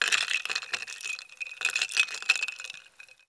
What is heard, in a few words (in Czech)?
nalevani s ledem; Mafie 1